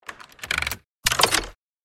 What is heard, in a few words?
Safe lock vault opening mechanism